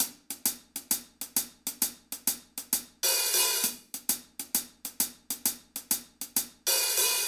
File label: Hihat